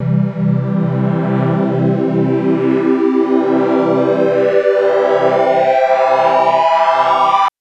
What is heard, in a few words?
Synth Triangle Sweep Pad maj c2; TrashLibrary